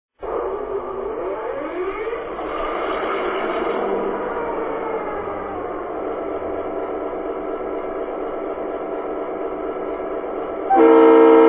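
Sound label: MP15DC 3 chime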